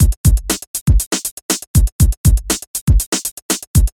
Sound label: beat; Sonic Foundry